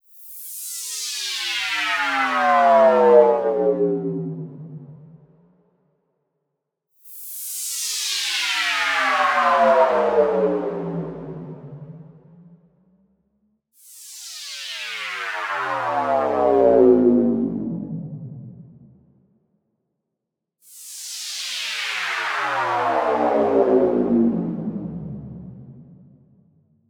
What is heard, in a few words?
fx falling spectre